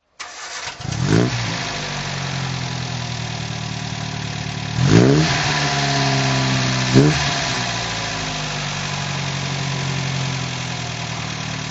MG TD

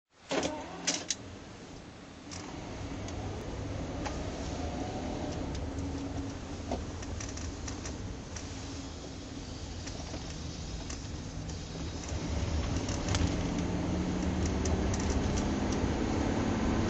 daimler double six drive

